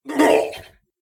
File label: Combat; Eshimal; SyrinScape; Snarl and Oraculum